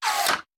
Bullet Impact; Sound Ideas; Guns

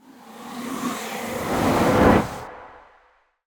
Ice Spell Freezing Slowing Frost Breath; Arctic Aura

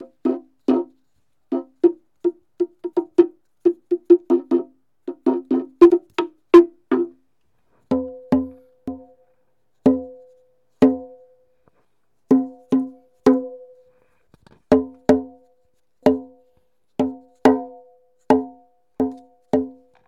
Percussion Native Log Drum; SFX Kit; Foley - Percussion

